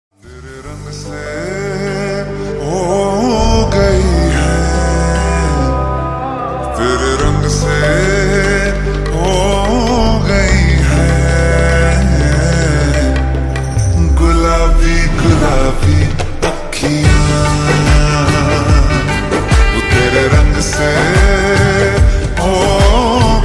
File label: Slowed Reverb Ringtone